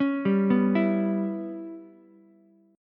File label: E Minor 6th E down Ukulele; Em6 arpeggiated; JGuitar